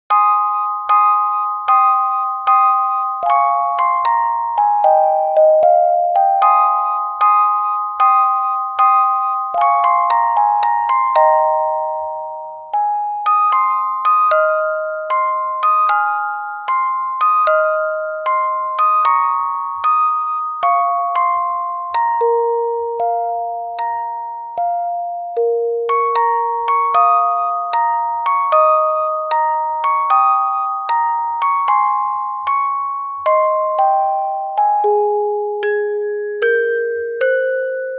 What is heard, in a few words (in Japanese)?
orgel; 雨ふり(orgel)